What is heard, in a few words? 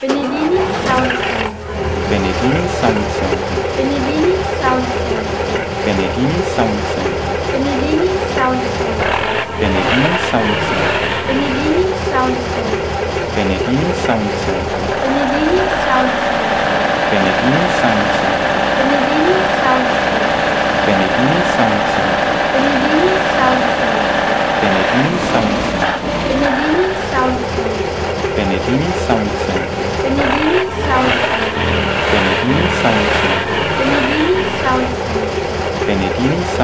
Skoda 706RT